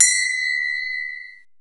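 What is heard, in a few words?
Tambourine, Roland S50 Percussion; Drum Samples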